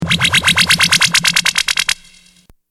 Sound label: Big Wooop